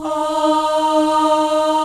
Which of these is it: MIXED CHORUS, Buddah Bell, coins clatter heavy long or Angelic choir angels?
MIXED CHORUS